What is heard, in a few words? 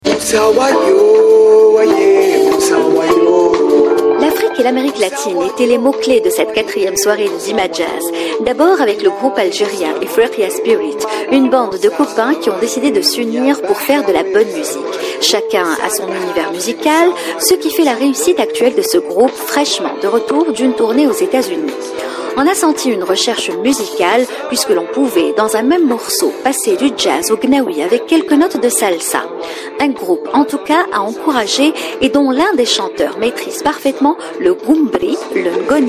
jazz